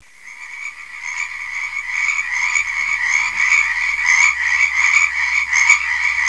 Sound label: chorus, herp